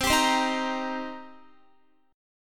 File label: C Minor 7th C Guitar; Cm7 strummed; x,15,17,17,15,17